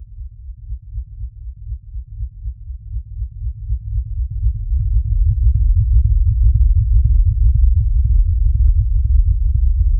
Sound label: portal